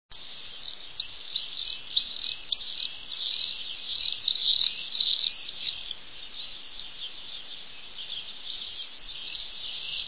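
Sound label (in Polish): jerzyk, głosy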